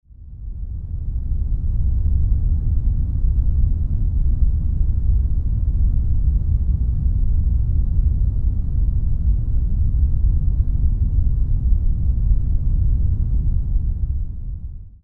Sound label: heavy bass red noise orange noise